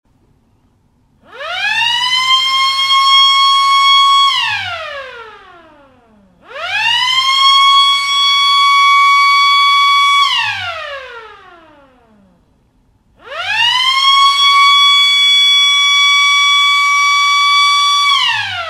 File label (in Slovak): vzduchová siréna 24V 110dB chrómová